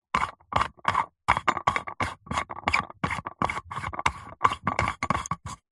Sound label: household lightbulb screw turns plastic lamp; zapsplat